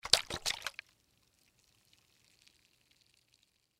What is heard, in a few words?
Snow Globe Shake, Snow Globe Shake Hard; Christmas Sound Effects; Foley